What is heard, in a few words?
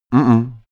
male deny mhmh; soundpool